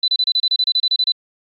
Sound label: low power ringtone; Samsung Galaxy S10